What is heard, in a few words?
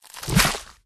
Spider egg